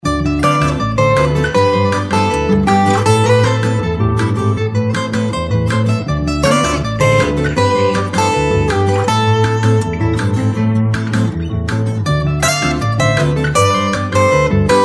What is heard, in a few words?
Latino Dream